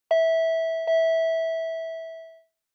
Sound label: Intercom Door Station Ding Dong